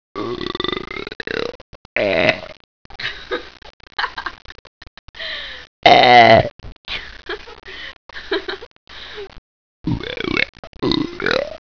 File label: Burps